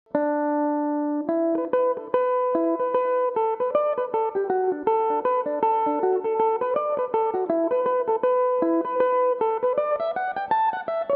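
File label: reel E dorian, irish mandolin, tunes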